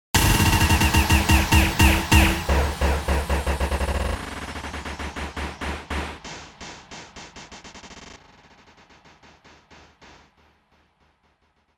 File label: GLITCHED BUTTON BURST